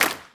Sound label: GROUP CLAP; HANDCLAPS; Spectrasonics; Heart of Africa